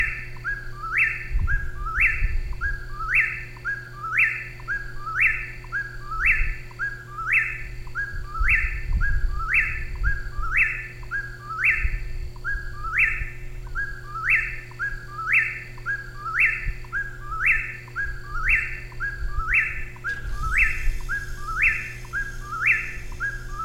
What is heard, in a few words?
Eastern Whip-poor-will Antrostomus vociferus